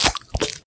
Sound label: smack, Cartoon impacts and smacks